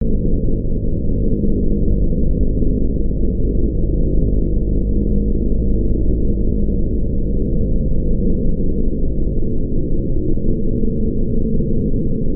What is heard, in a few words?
cargo cabin plane